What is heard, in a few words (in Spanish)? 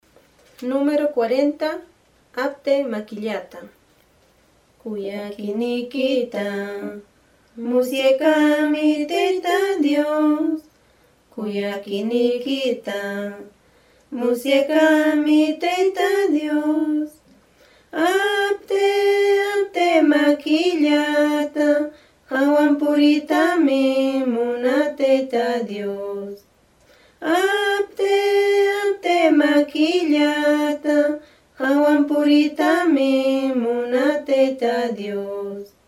cancion